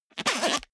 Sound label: zipper small case open fast